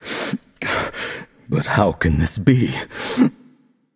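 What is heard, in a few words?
nonverbal boohoo; voicepack; smite; merlin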